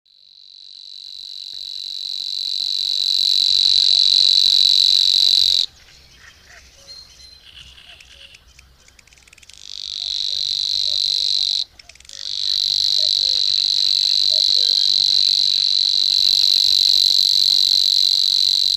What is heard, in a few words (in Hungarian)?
Nádi tücsökmadár; Madardalok